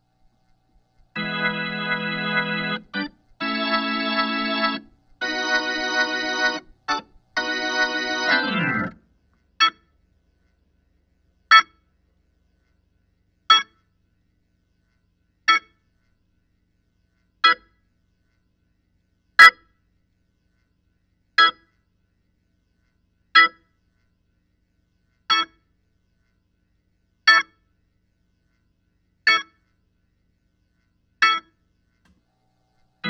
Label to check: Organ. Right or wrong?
Right